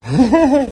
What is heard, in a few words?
heh heh heh, laugh; Voice; ooh ahh